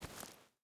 footstep barefoot gravel walk; Rust

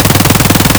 glock18 outdoor distant loop; Escape from Tarkov

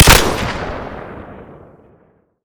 m60; Modern Warfare

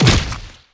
Death Hit; Civ4 warlords

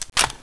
Inf Weapons Foley 3rd; Red Orchestra 41-45

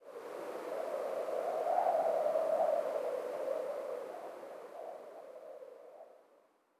ambient port default; World Of Warships

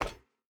footstep hide metal run; Rust

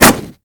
bullet canvas, weapons impact; Call of Duty Modern Warfare

